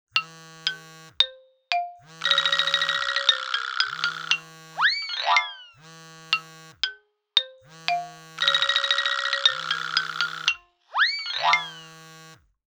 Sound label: cell phone; mystery island